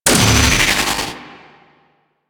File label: Electric Zap Large; Ambience; Props; Warframe; Corpus Railjack